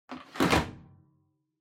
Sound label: Washer Front Load Filter Upper Insert Strong